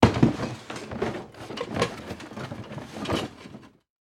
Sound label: Furniture Rummage Through Drawers; Household